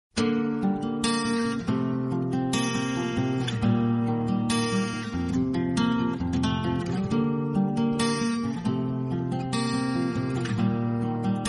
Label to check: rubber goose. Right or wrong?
Wrong